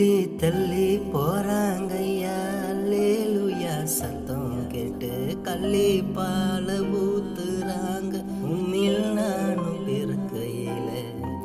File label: tamil christian ringtone, christian gospel